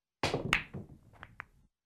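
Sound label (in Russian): удар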